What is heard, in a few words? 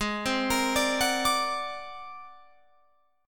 G sharp 9th Flat 5th G sharp Guitar Open F; G#9b5 arpeggiated; 20,19,22,21,21,21; JGuitar